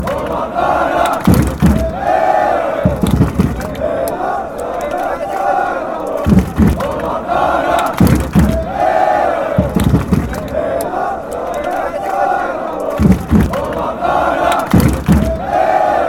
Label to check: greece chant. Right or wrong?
Right